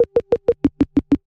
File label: blips, electronic; Loops